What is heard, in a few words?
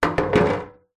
household stool chair metal knock over wood deck; zapsplat